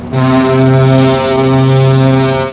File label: ship horn